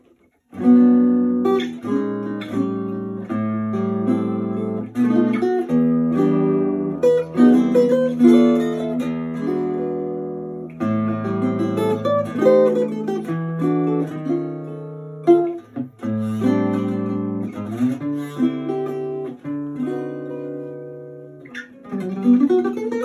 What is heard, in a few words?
solo sound sample, Jazz Guitar; De Luthiers Dordrecht